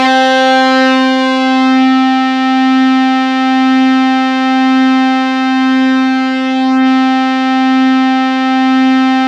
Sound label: C4, Synth Line; 90 s Sample CDs; NiceBeats; SynthLine VOL-33